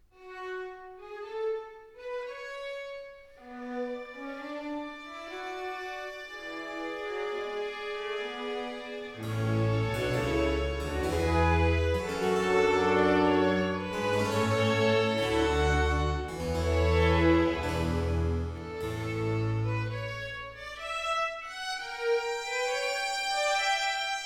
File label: Concerto in G minor for strings and harpsichord Largo